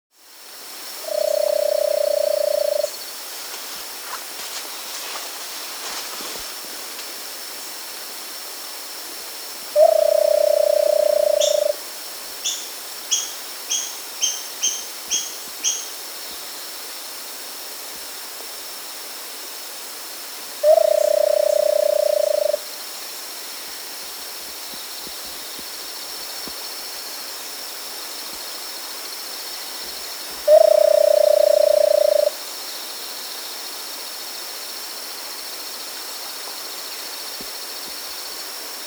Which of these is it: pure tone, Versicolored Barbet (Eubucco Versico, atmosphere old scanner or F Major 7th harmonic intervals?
Versicolored Barbet (Eubucco Versico